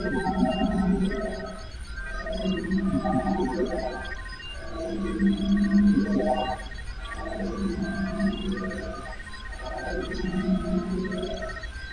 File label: sphere, ships rooms